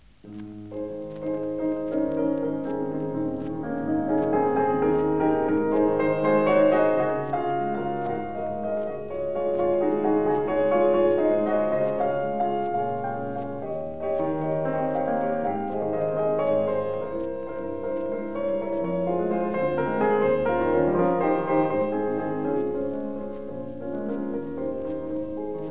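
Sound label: Nouvelle Etude in A flat major, piano; CHOPIN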